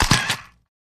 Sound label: 35mm Camera Auto Adv